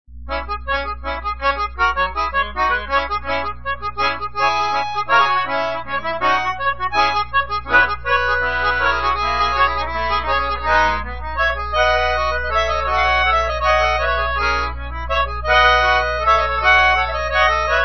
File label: pianica